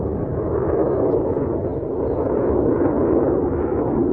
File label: patrol loop, gunship; hl2; combine gunship